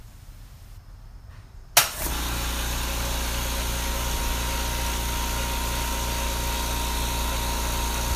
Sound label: New Compressor